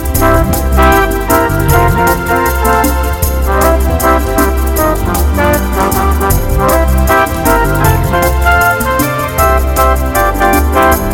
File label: Fast Brush Jazz